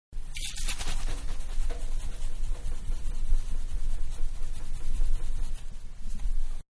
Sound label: Can Shaking Foam, Can Aerosol; Shaking (Sound Effects:Objects); Manhattan Production Music